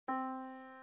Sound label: yamaha Disk